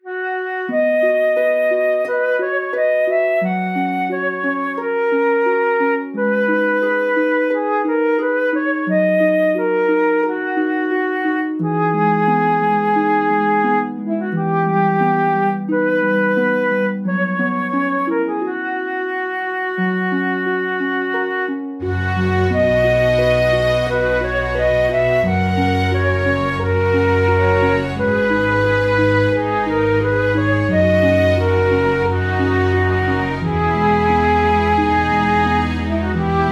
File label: village loop; otome the exorcist